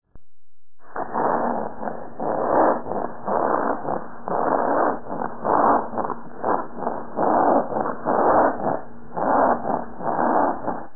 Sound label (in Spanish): Estridor Escolar